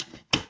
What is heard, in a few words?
mixer close